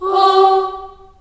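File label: G3 Choir Children Ohs, Choir Acoustic & Synth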